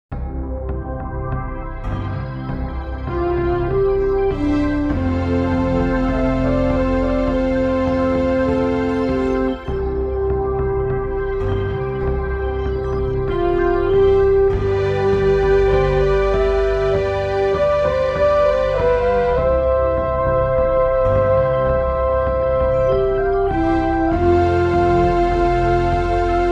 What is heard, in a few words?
F horn